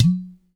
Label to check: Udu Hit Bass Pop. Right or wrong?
Right